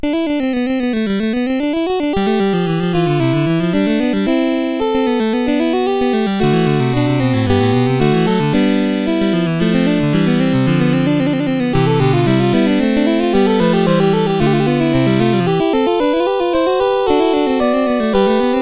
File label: Fugue, CSG8580R5; Fugue VIII 5